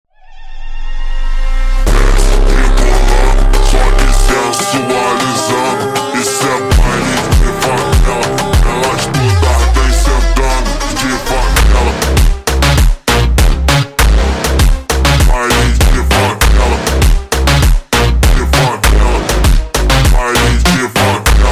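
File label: slowed, phonk music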